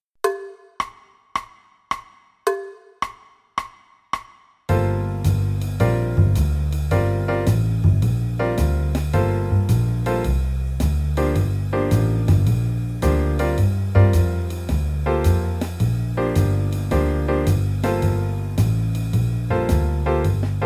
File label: Play-along G Dorian, qn=108, Trumpet; 32 measures; A Dorian (concert G Dorian); Improv; Playing with A Dorian